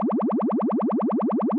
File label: Motion Pop